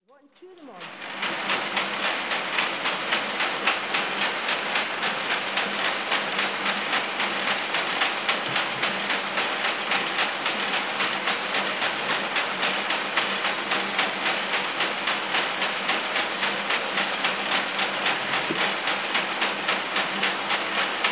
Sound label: beaming machine